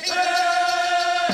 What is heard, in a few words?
VOC